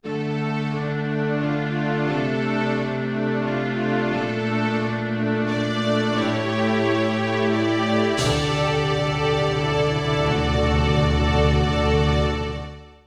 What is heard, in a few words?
Open Door of Temple of Time; Nintendo 64; Legend of Zelda, The - Ocarina of Time